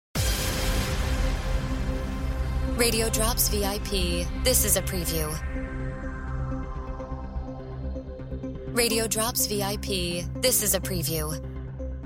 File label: Music Bed